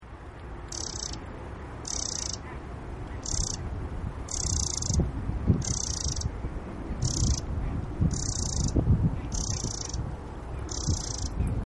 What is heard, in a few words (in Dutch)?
Winterkoning Troglodytes troglodytes Geluid; Annelies van Klinken